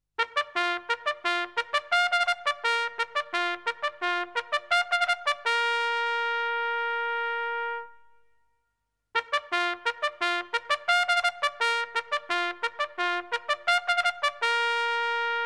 TROMBA MILITARE

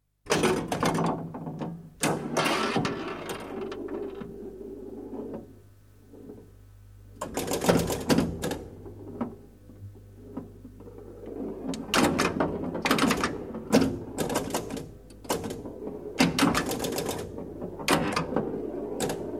FLIPPER

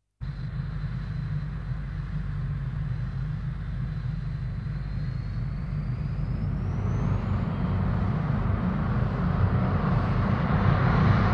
PASSAGGIO AEREO A REAZIONE

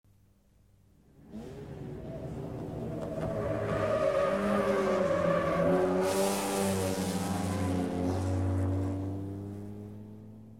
PASSAGGIO AUTO DA CORSA SGOMMATA

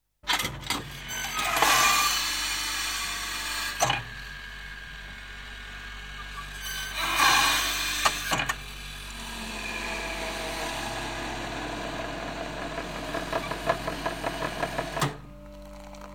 OFFICINA FABBRO MOLA

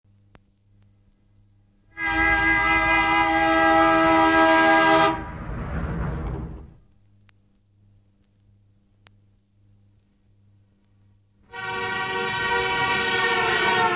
CLACSON AUTO PASSAGGIO

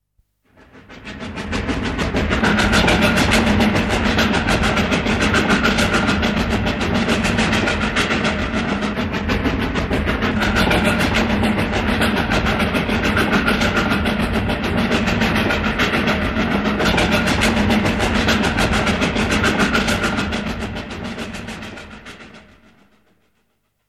TRENO A VAPORE CORSA

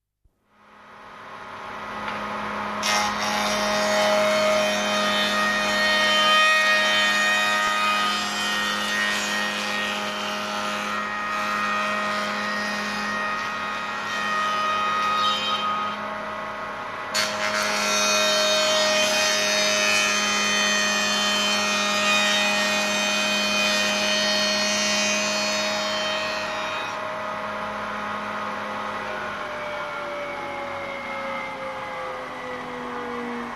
MOLA ELETTRICA FABBRICA

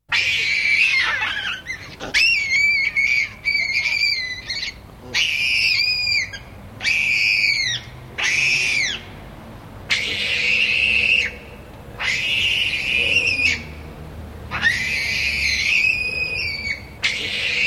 URLO SCIMMIA

